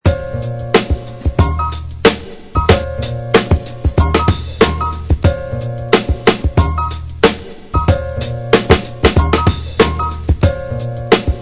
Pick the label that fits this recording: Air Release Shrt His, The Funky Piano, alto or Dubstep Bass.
The Funky Piano